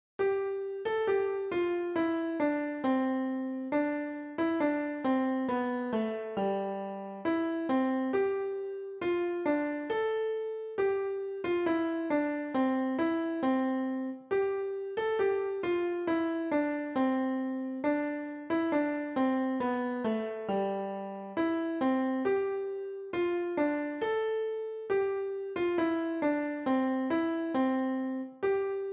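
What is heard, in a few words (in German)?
Brüderchen komm tanz mit mir, Klavier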